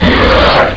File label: pain, bug; scourge